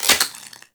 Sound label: Quick Draw Mech; weapons; Warframe; The New War; Omicrus